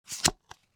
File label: card draw